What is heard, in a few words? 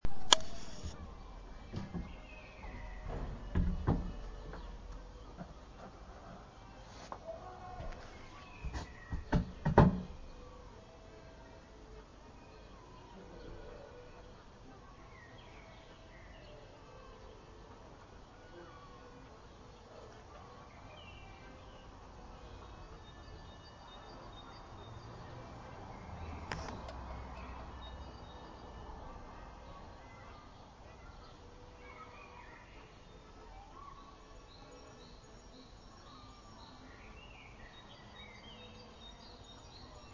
accordionist courtyard